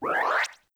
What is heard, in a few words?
race gate activate; Garry's Mod; racing tool